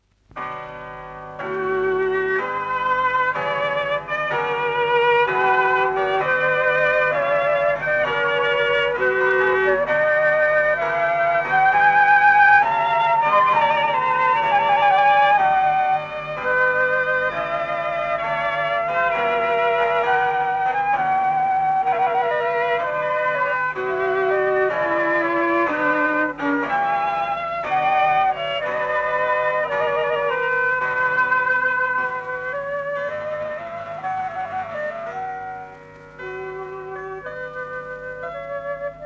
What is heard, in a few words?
5th Brandenburg Concerto (D major) II. Affectuoso; J.S Bach; L'oiseau - lyre